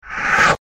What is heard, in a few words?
black hole